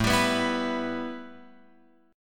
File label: A 7th Suspended 2nd, A, down, Guitar, Open F; A7sus2 strummed